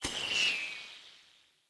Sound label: Bow Corrupted Arrow By; Weapons; Enemy; Bows; Hunter Disguised Bow; Dendrit